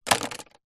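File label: vehicles car keys set down on plastic centre console interior car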